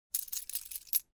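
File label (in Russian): монетами поигрывают в руке карман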